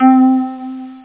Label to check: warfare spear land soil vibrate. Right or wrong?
Wrong